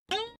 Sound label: cartoon boink